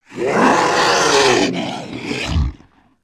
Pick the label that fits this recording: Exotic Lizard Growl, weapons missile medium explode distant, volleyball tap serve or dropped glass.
Exotic Lizard Growl